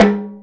Timbale High; GMPercussion; 3DO; Toolkit 1.5; GMPercussion22k

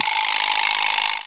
Vibraslap; GMPercussion; 3DO; Toolkit 1.5; GMPercussion44k

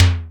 High Floor Tom; GMPercussion; 3DO; Toolkit 1.5; GMPercussion44k

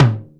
Low Mid Tom; GMPercussion; 3DO; Toolkit 1.5; GMPercussion44k

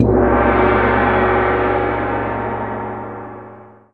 Chinese Cym gong; GMPercussion; 3DO; Toolkit 1.5; GMPercussion44k

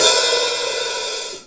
Hi Hat Long Close; GMPercussion; 3DO; Toolkit 1.5; GMPercussion44k

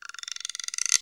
Guiro Slow; GMPercussion; 3DO; Toolkit 1.5; GMPercussion44k

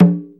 Conga Low Open; GMPercussion; 3DO; Toolkit 1.5; GMPercussion44k

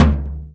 Low Floor Tom; GMPercussion; 3DO; Toolkit 1.5; GMPercussion22k

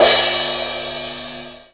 Crash Cymbal Low; GMPercussion; 3DO; Toolkit 1.5; GMPercussion44k

